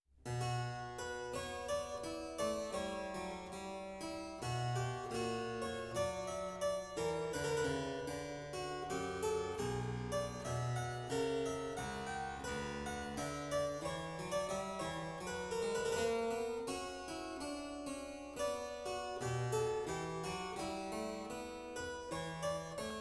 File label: Harpsichord; Audio Loudspeaker Quality